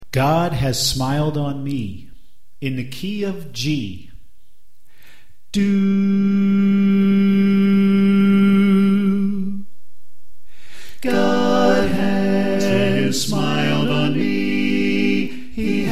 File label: Soprano Left